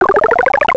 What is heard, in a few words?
freeze; mother2